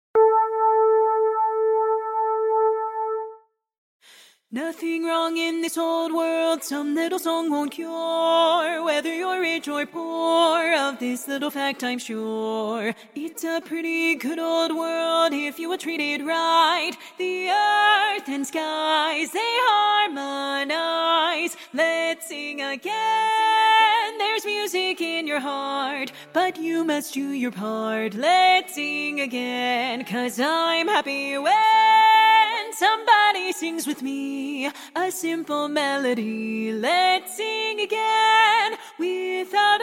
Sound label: lead